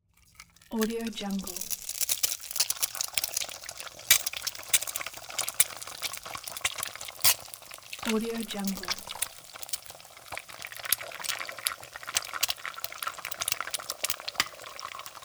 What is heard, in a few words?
frying eggs